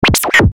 Glitch Pitch Drop, Glitch Distortion Warble Static; Production Element Imaging Element Accent Transition